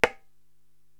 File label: Baya Mute; Korg TR-Rack